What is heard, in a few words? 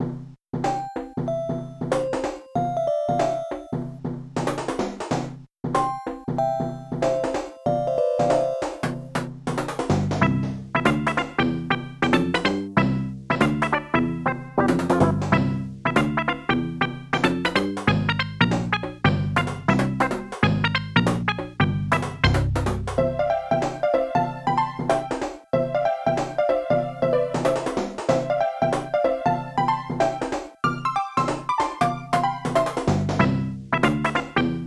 TOWN LOOP 94bpm; SAGA Complete Collection v2.0; SAGA V - Towns, Shops, & Mini-Games; Friendly Faces